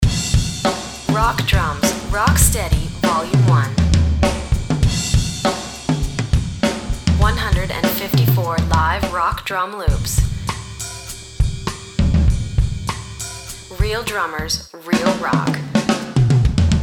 rock steady drums

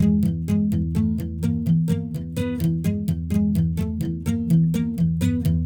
tension picked; oct1; cryptology

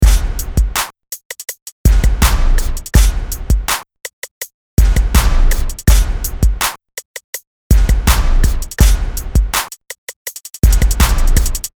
drum loop

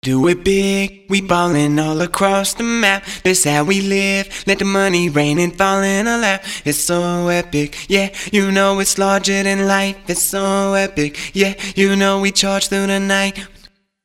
vox with melo

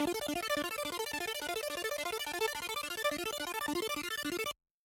chord sequence audio

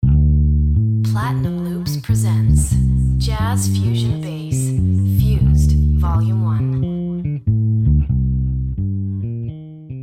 jazz fusion bass; Jazz loops

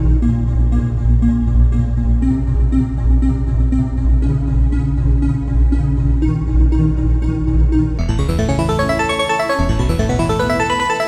synth electric lead lines

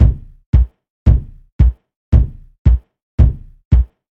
Bass Drum Example

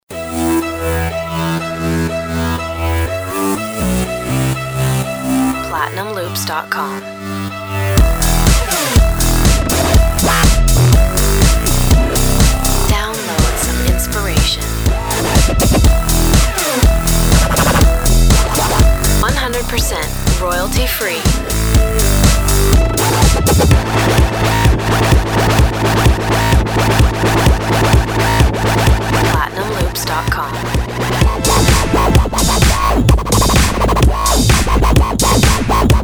edm samples

